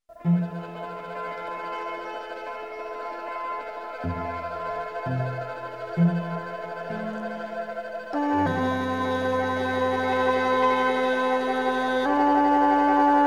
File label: fish song; Yu Ge